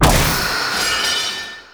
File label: Grineer Royal Guard Sceptre Drop; Foley